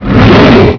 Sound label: Dragon Grunt; Playable Tutorial Level; TR2